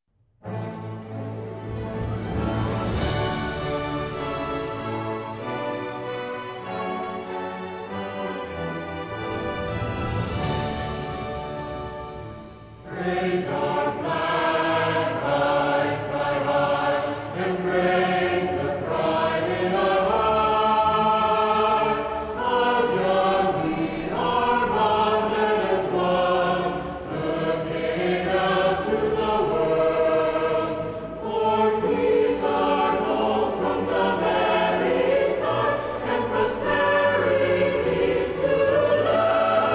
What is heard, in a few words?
with accompaniment